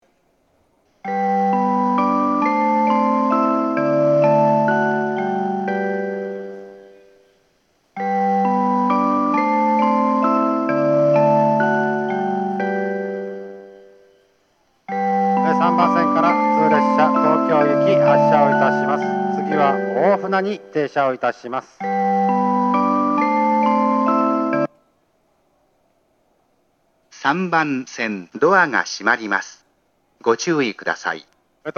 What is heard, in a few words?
melody; Fujisawa; 発車メロディー